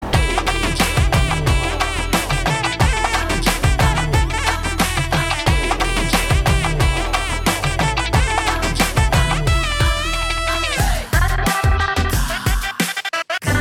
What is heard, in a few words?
BGM Ringtone; Jai Bhim